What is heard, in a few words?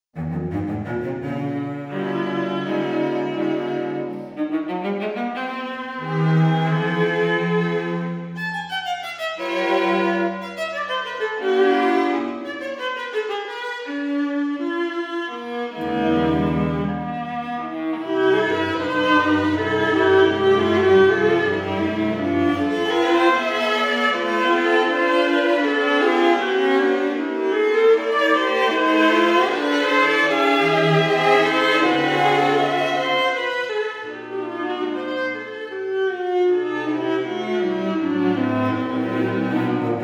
Trio mix